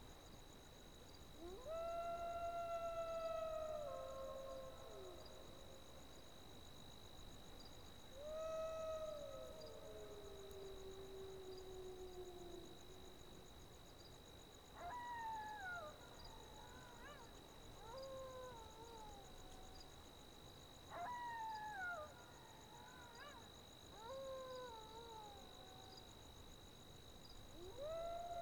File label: ambience outdoor night